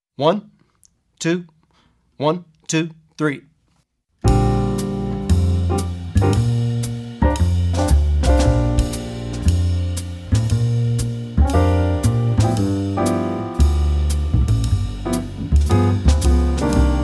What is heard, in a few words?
Jazz Alto Sax without alto sax soloist; Effective Etudes For Jazz Alto Sax